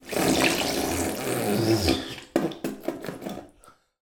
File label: human spit bath water from mouth